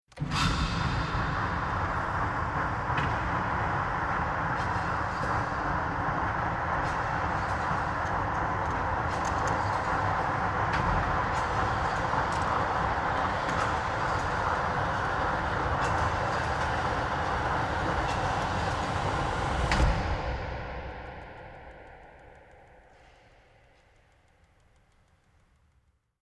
Industrial Big Electronic Operated Door Long Run To Fully Closed Int Perspective Large Rev; Tom Hutchings